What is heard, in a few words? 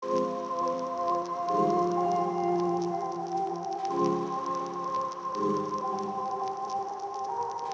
atmo